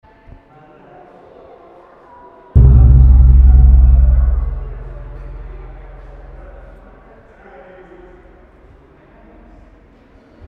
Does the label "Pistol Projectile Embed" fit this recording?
No